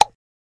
Ketchup Bottle, Gushy Sound, Bottle Suck

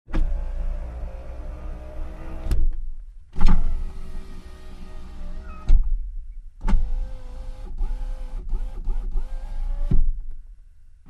Jeep Wagoneer: Electric Window Open and Close.

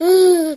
Baby: Happy Noises And Coos

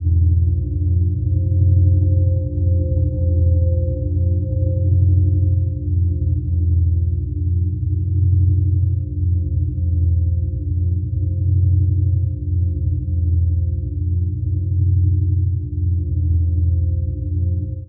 engine hum, engines

